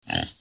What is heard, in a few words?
call, pig; creature; Cubed